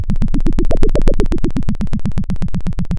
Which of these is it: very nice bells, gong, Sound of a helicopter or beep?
Sound of a helicopter